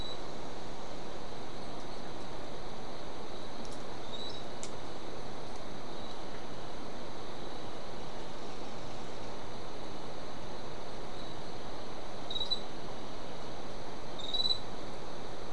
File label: mainly insect cluster